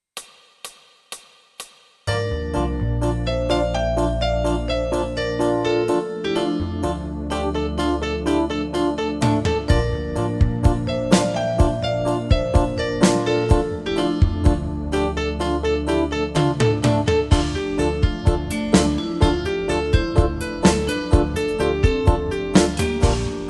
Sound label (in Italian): Ascolto piano tutti